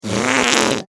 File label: Cartoon Whoopee Cushion Fart; Miscellaneous Cartoon Sound Effects; Miscellaneous Cartoon (Sound Effects:Cartoons); SFX Bible 6